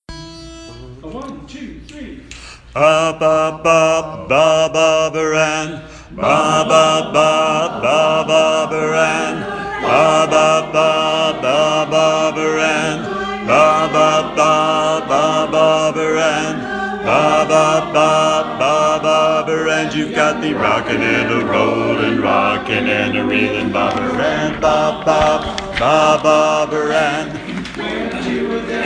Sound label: Bass